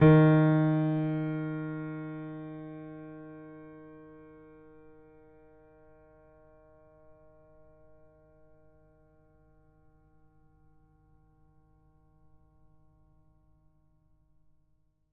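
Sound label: D sharp 3, piano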